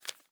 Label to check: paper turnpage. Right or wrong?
Right